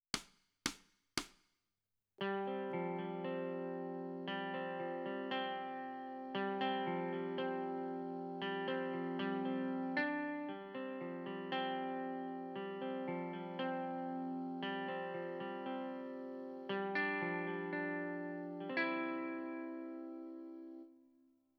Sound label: Guitar Right